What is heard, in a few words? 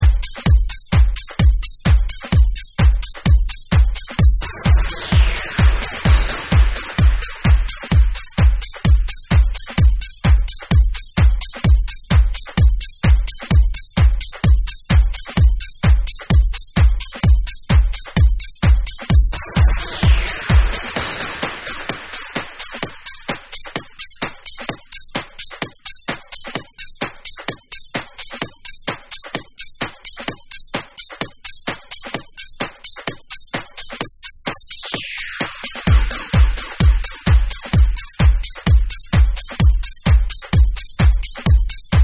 Bonus Beat